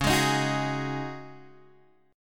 C sharp Suspended 2nd Suspended 4th, C sharp, Guitar, Open G